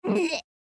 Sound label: Human Gag; Gag Male Seven Yrs Boy; Gagging; Voice; Blastwave; Humans